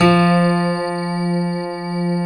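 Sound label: grand piano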